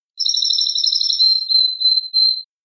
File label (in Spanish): canario; campero